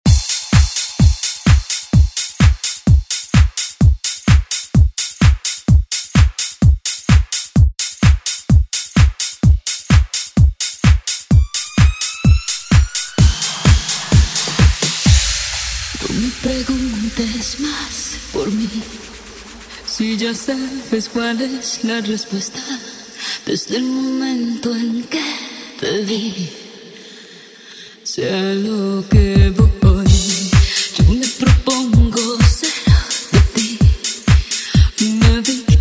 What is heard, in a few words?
Dance ReDrum; DJ Mhark